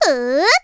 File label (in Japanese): ふーうーっ, Breath; Voice&Breath; Vocaloid 6.5.1; Otomachi; VOCALOID6 AI OtomachiUna Spicy